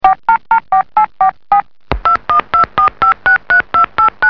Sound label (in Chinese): 电话拨号